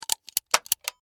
Steampunk Device, Scifi, Steampunk, Device, Clicks, Small; BlastWave